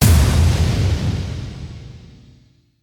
Impact

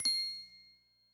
FLIP

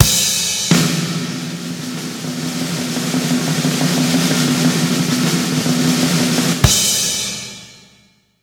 drumroll

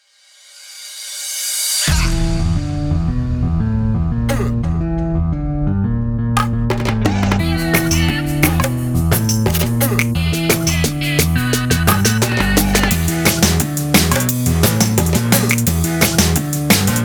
INSTRUMENTAL

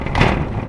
open

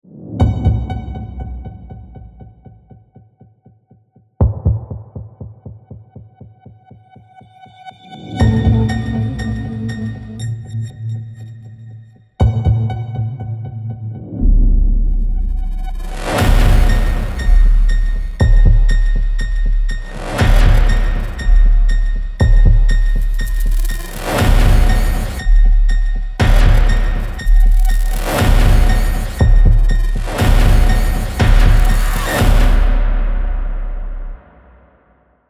Android Interrogation (RHYTHMIC)

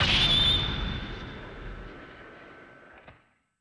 shock

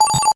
beep

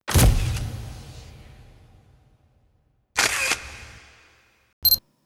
camera

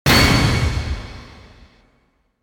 Final Thud

